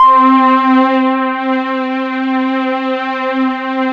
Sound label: PAD LOVELY, Synth Pads; SYN-PADs; Synth-Pads 4